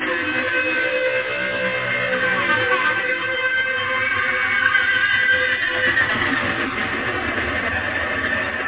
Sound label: distort, guitar